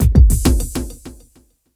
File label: drbreak, drumbreaks; Masterbits; Vinyl Attack